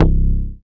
BASS MONO

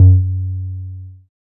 BASS MINIMOO

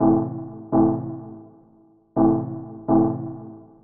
DEEPHOUSE ORGAN 125 A

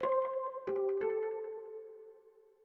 ETHNO 90 D

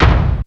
BD FX, bass drum; BASSDRUMS

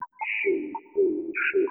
TECHNO BEATS 140

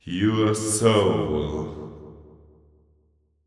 deep house male, 125, A, vocals

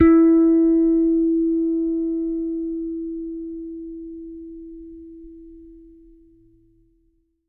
T-BIRD BASS FINGER E4; Bass Mace; DirectWave

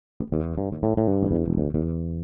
DEEPHOUSE BASS 125 A

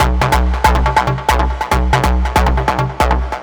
TECHNO EXTRA 140

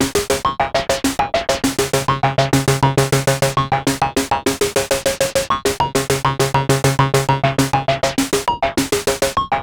DANCER C3, LFO DANCE

